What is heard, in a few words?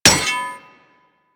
Melee Axe Impact Generic; Warframe; Weapons; Axes